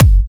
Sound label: Kick; We're Running In Cirlces